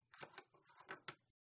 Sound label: Hook And Eye Door Latch Unlock; uberduo